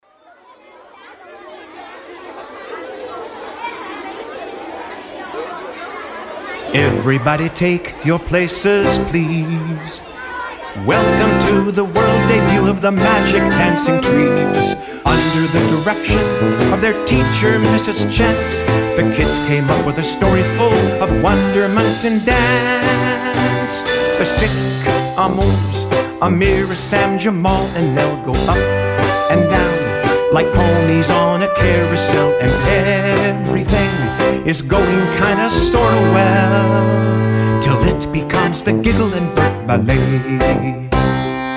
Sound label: Giggle And Burp Ballet